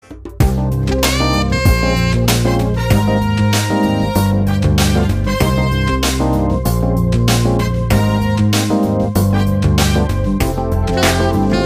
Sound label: funky clavinet